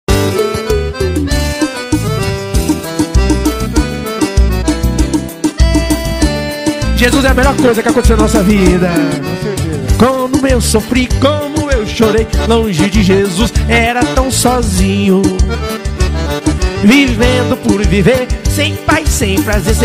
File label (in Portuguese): gospel ao vivo